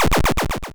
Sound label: power down